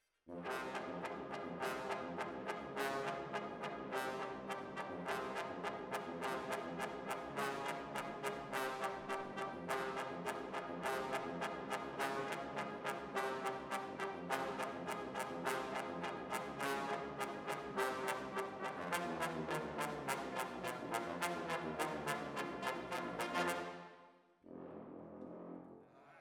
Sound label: ORTF, Jazz for piano